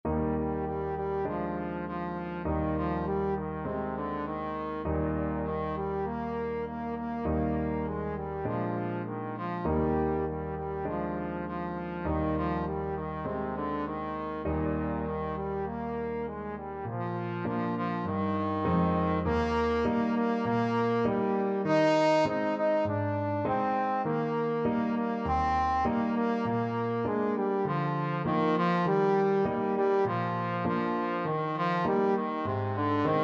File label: waltzing matilda, trombone